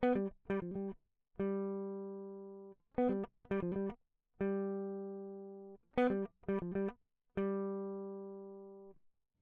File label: electric